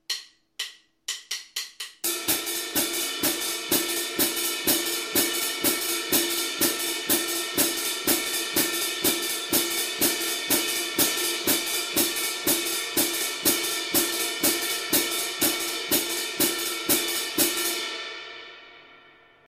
swing rapide feeling croches

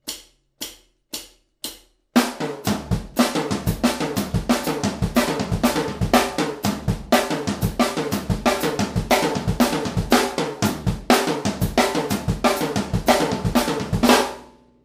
break passe partout, batteur

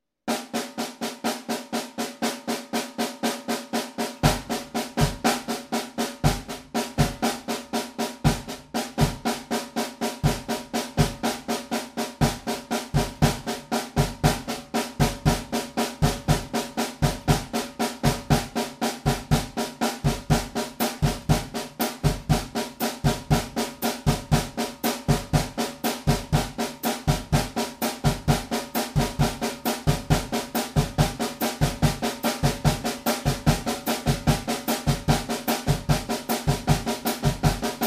samba batterie base

